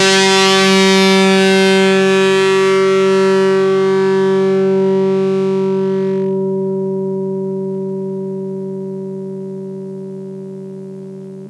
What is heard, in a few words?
Blues Open G4, Blues Guitar